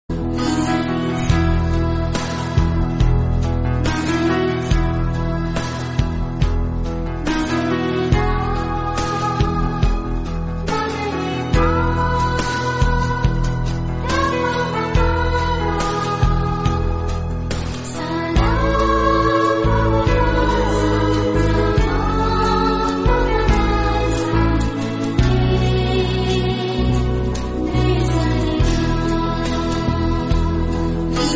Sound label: bgm, instrumental